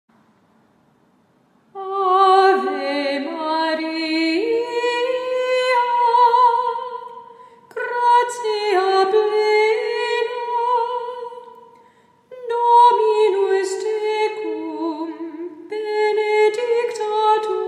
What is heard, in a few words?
Ave Maria Gregorian Chant